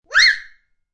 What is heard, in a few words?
Child Quick Scream, Child screams; Screaming; Voice